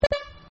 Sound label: courtesy honk